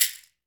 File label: Latin Shakers, mexican